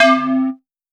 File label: Bell Synth one shot; Sonic Foundry (Sony Creative Software); Electronic Point Blank